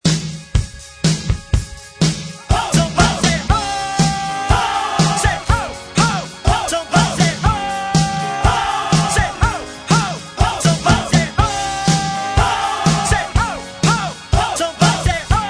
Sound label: Rock